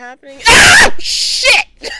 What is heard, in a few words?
aahahahah